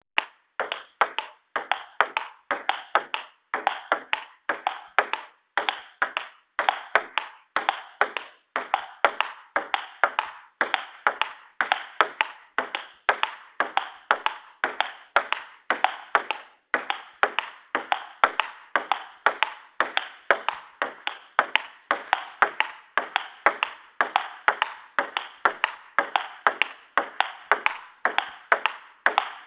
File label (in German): psv klingelton; Postsportverein Guetersloh